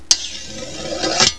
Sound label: SHARPEN